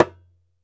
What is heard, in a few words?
ASHIKO, African Drum